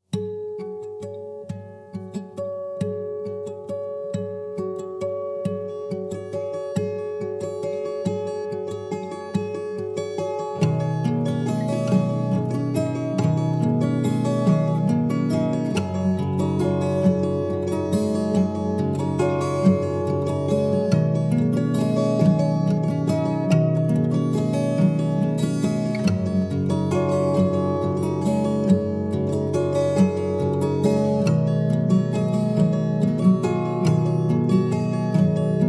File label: guitar